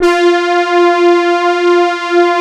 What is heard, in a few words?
SYN S BRAS, Roland, digital; Sound & Vision - Gigapack I CD 2